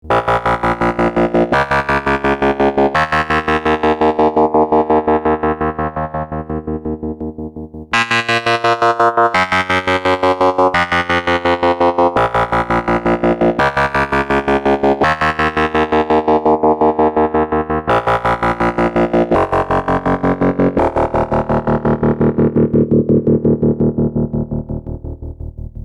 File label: sine fm